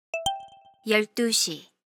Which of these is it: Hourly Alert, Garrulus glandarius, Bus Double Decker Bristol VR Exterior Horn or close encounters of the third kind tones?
Hourly Alert